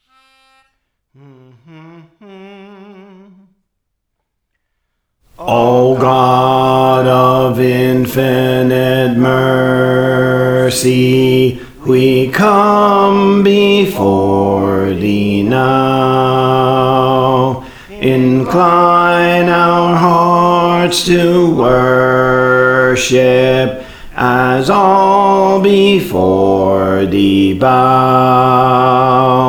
Bass, Songs; Praise For The Lord Training; O God of Infinite Mercy

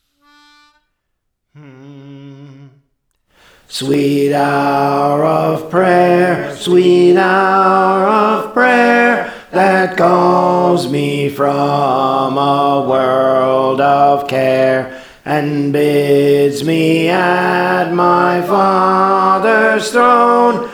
Alto; Sweet Hour of Prayer; Praise For The Lord Training

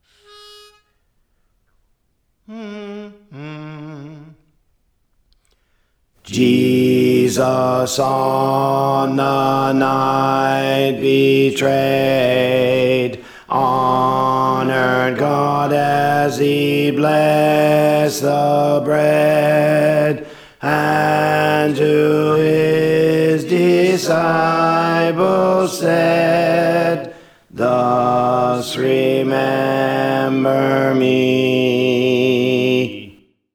Alto; Praise For The Lord Training

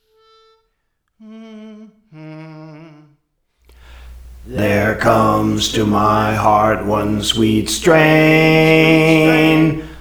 Bass; Praise For The Lord Training

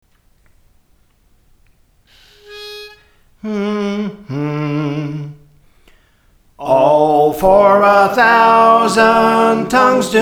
Soprano, Songs; Praise For The Lord Training; Azmon

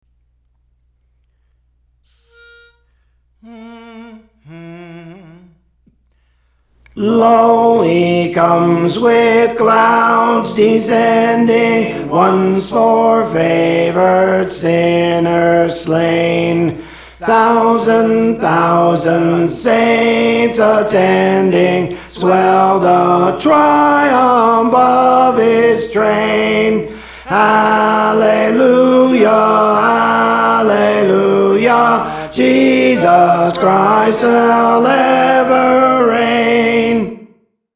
Tenor; Praise For The Lord Training; Lo He Comes with Clouds Descending

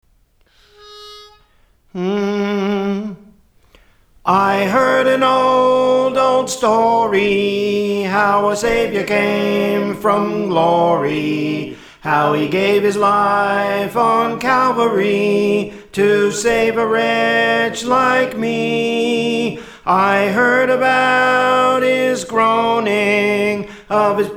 Soprano; Praise For The Lord Training

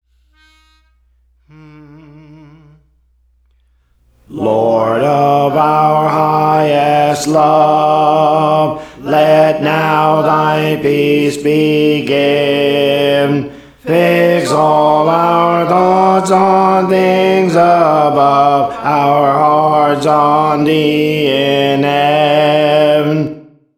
Alto; Praise For The Lord Training

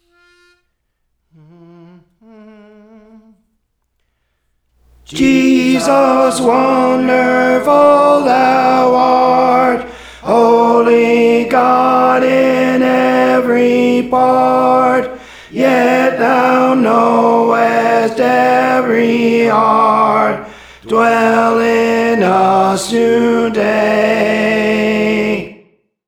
Tenor; Praise For The Lord Training; Jesus Wonderful Thou Art

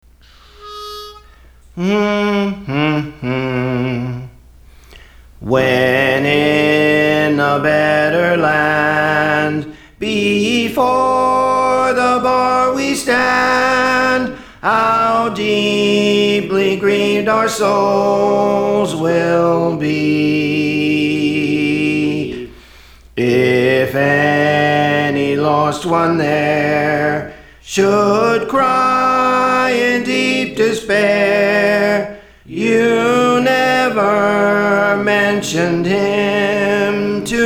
Soprano; Praise For The Lord Training

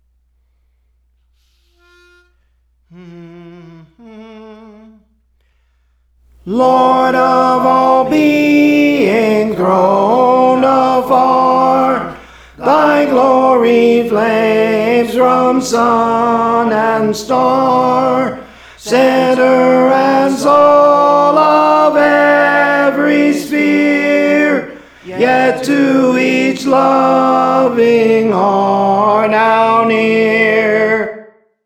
Tenor, Songs; Praise For The Lord Training; Lord of All Being Throned Afar

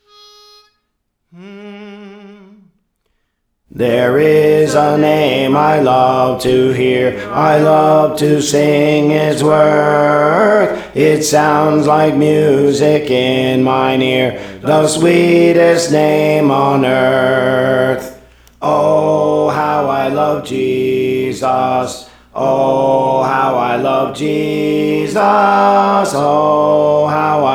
Alto, Songs; Praise For The Lord Training

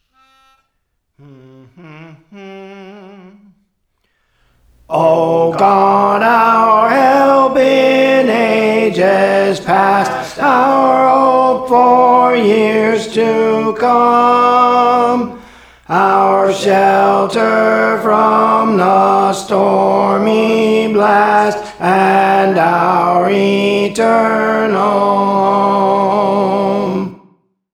Tenor, Songs; Praise For The Lord Training; O God Our Help in Ages Past; St Anne

